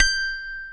G5 dulcimer; AKAI S6000 CD-ROM; WorldSounds

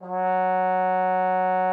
trombone F#3; Xsample VOL-3

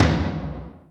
Korg N5 Rock Kit

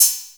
CR-8000; Best Service Gigapack 2

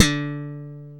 D 2 slap, bass; AKAI S-Series CD-ROM Sound Library VOL-8

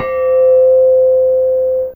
Xylop C2, Fairlight CMI IIe, Mallets

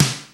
SD, DRUMS; Crypton - DrumBase5000; CD DRUMS #1

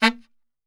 A#1 SAX SHOT

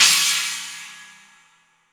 China Cymbal; Roland BK-5

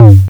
TOM, TRASH KIT; Drums Dance & Groove; USB Soundscan vol.11; AKAI

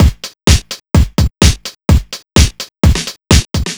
Drums, 127bpm, Nu Dance Electro; Zero-G; Soundclash; Combi Loops; Fisherpricer

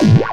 acid A, synth notes; Techno Trance Essentials

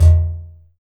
XTRA PERC

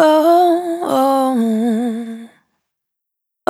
Spin Dry, Single Voice Melodic Loops

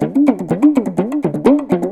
PAPAN, Indian Traditions; USB Soundscan vol.45; AKAI

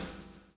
BLOW; Spectrasonics - Backbeat

